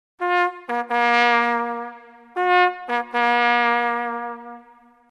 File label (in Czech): postupovat pomaleji, troubeni